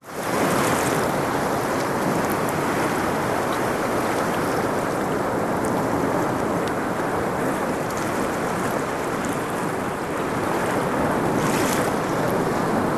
nature rock pools close up small waves distant surf